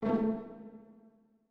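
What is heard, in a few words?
orchestra; Masterbits - Switch FX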